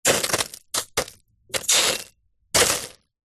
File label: foley metal hot water drips into sizzle; zapsplat